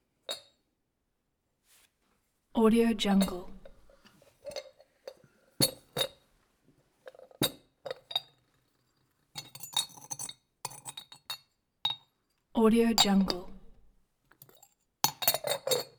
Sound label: dinner fancy sugar bowl with lid and spoon